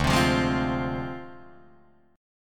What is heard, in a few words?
D Minor 7th Flat 5th Guitar Drop D